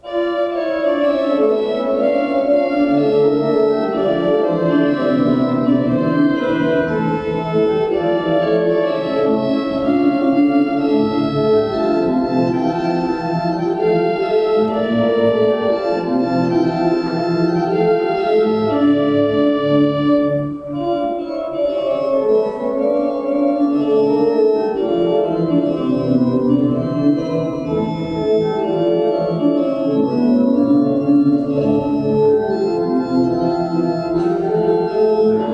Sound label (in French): Orgue